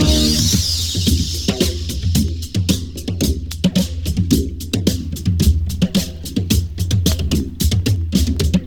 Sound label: 110 bpm drum beat c sharp key